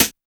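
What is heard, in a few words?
YIPPY snare; Drums In Your FACE!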